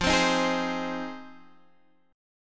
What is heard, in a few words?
F Minor 7th F Guitar Drop D